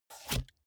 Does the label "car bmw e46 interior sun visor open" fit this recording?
Yes